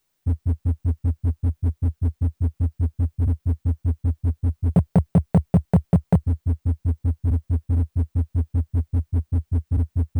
030bpm; elektron machinedrum

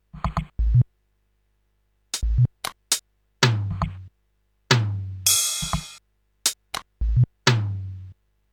stereo beats loop; rauschpartikel

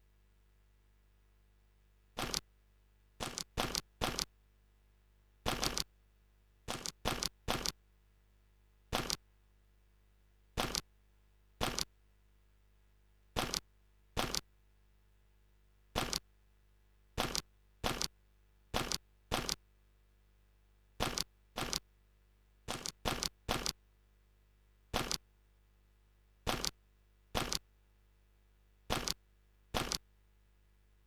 stereo instr loop; rauschpartikel

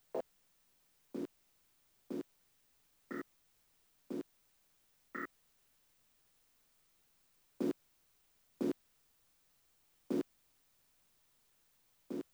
030bpm; elektron machinedrum

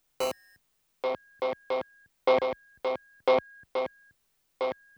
105bpm; elektron machinedrum

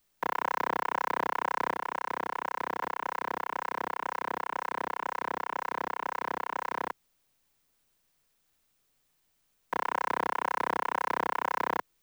030bpm; elektron machinedrum

